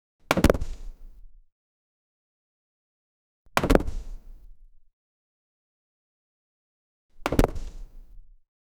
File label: lottery button clic, game show